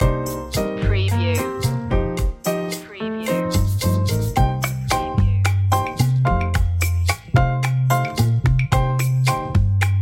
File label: havana lounge